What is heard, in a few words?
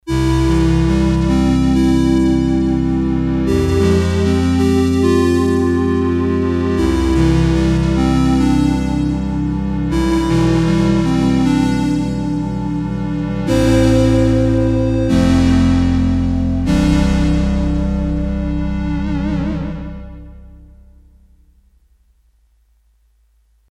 Korg DS-8 Sqr Wave Pd; Factory Patches